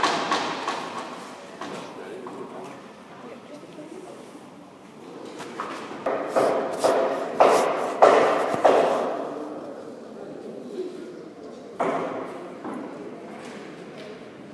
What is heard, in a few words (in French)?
Orangerie chantier cafe sons